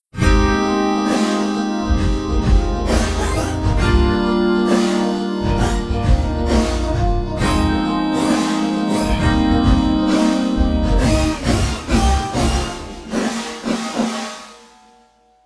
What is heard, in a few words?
Pop; speed 0.56; tsmtoolbox; phasevocoder